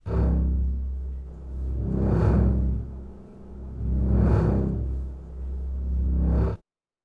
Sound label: Angry Lo Stabs Reverse C 110bpm